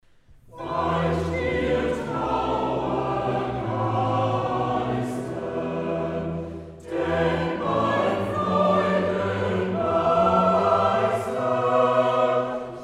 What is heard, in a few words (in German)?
live Choral SATB; BWV 227; Weicht ihr Trauergeister; Kantatenkonzert